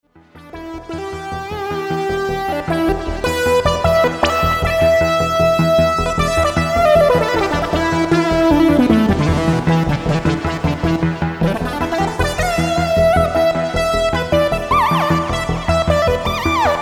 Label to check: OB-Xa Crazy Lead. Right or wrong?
Right